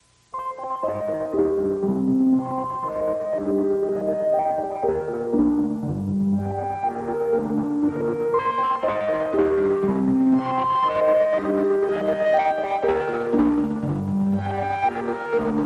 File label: Modular Tech